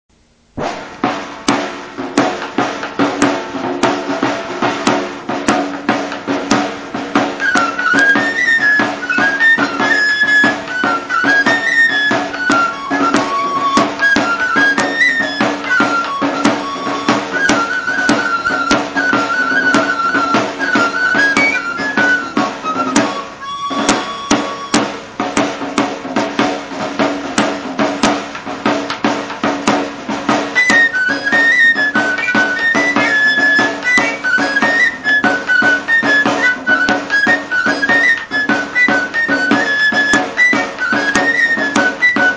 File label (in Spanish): charro sayago